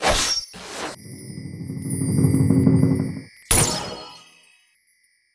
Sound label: login